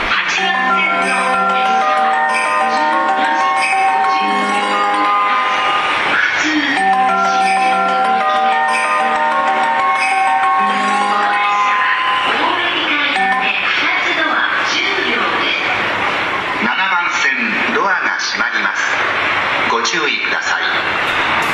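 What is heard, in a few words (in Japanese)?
発車メロディ, 大崎駅, 埼京線・湘南新宿ライン・りんかい線; 新宿・新木場方面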